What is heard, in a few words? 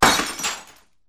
glass shatter